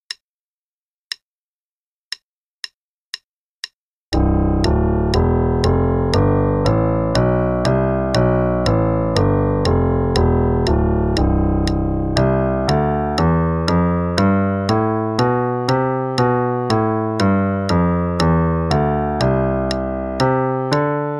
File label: C Scale Warm-up, qn=120; Level 2B - 2nd Ed